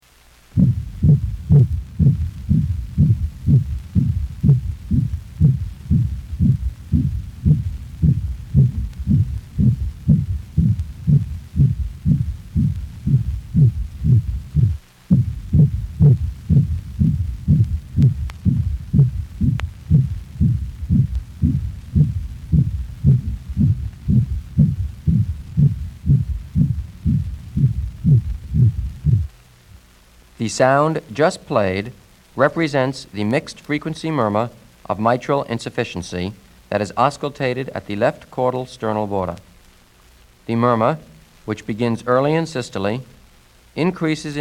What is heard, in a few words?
Murmur